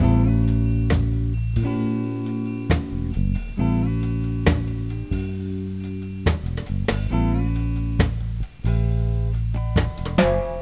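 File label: MENU, music; Pocket PC; VRally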